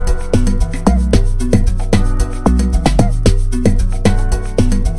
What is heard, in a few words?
Type Beat